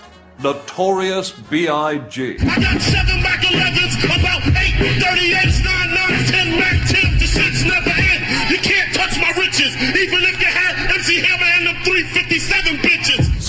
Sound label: karaoke